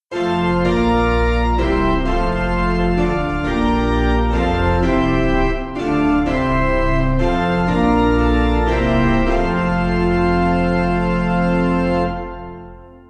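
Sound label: Piano, hymns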